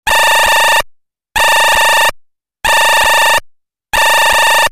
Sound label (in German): feldschwirl, Vögel; midi; Polyfonisk musik; siemens